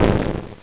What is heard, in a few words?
medium explosion; Powermanga